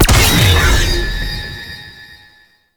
Explosion; Warframe; Sentients; Repeater

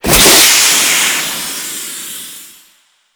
Steam Damage Hit; Warframe

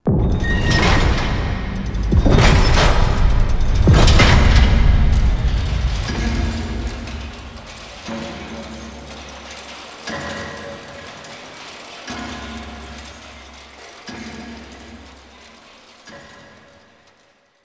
Ceiling Clock; Warframe; Tau; Cinematics; BornAnew

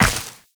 Step Ice, Footsteps, Walk; Warframe; Tenno

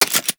Mech; Hek

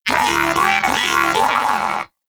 Moa Pet Tenacious Enemy Spotted; Pets; Warframe

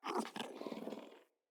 Kubrow Puppy Interact Sleep; Vocals

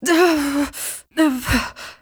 Fight Breath; Cinematics; Warframe; TheNewWar; Throne Room

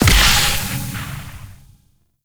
Corruption Heal Burst; Enemies; Abilities; Warframe; Zariman; Void Angel

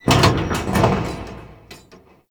Large Vehicle Creak; Warframe; Ambience; NineteenNinetyNine

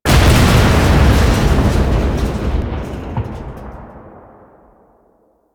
explosion; Rusalka; Outro; Warframe; NineteenNinetyNine; Stage8ShitboxConvoy

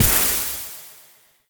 Destructible Plant Spores; Warframe; Ambience; Grineer

